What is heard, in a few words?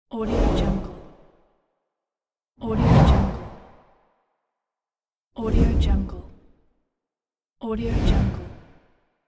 whoosh